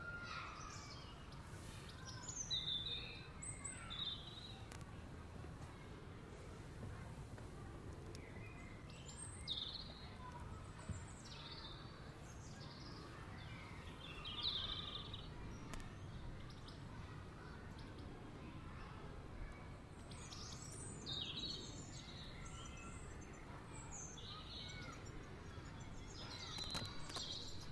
stereo spring soundscape in my garden yesterday afternoon